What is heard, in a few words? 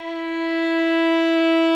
guitar violin